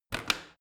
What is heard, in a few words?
washing machine rotary button press hard